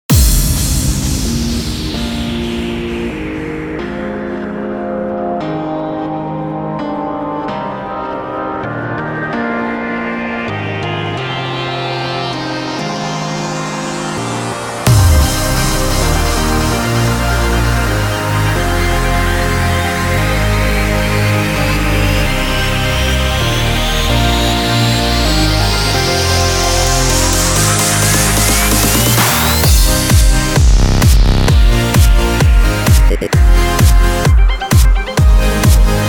Complex Electro Tools, Dubstep Electro House; Noisefactory; Complex Electro Tools Vol. 2; Loopmasters

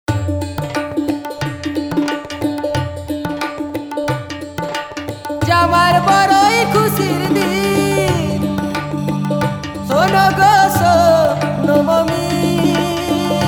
Voice of India, Cinematic Vocals; Loopmasters

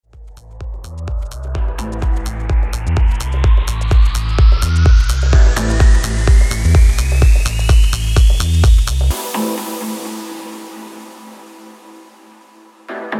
127 E, Tech House & Deep Minimal; Minimal Tech House Techno DJ Tools Deep House; Blue Lotus; DJ Mixtools 03; Loopmasters

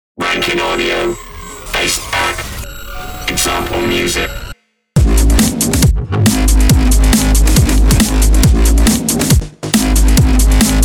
Heavy Bass Music; Heavy Bass Oneshots; Drum and Bass Dubstep Bass; Loopmasters

